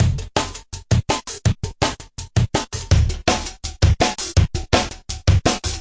165 live two tone tek step, drum loop; 161 to 180 bpm

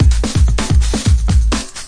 128 bpm lofi 8 break

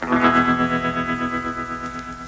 one perfect retro guitar chord

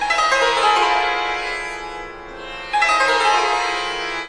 Lalit Swaras Dn; Far East Drones and Plucks

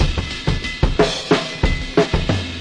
091 bpm lofi clanging ride, drum loops and breaks

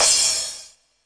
909 bright crash; Crashes

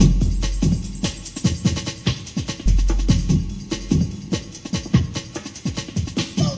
146 bpm breaks forever faraway; 141 to 160 bpm

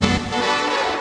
horn intro; Horns and Brass

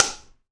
Dasani Kit, ethnic and world percussion

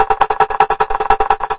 150 rolling percussive, drum loop; 141 to 160 bpm

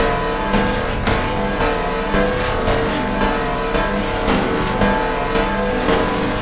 149 carbon grinding clanging; SYNTH AND ELECTRONIC LOOPS

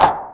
Syn Dr, Overloaded Synthetic Drums; style kits

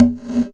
GATE CONGA, Gated Reverse Slapback; drums full kits; style kits

